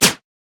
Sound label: jack in the box basic atta; jester